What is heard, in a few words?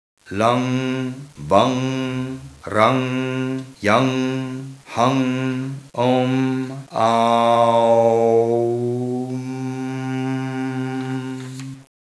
CHAKRA SOUNDS ONLY